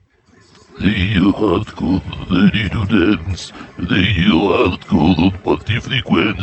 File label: jingiel; musician